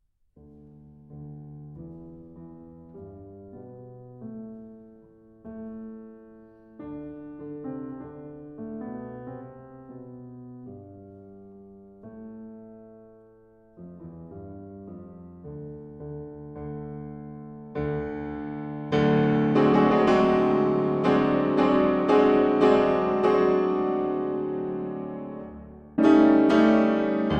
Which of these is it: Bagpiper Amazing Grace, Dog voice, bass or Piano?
Piano